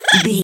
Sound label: goblin fairy attack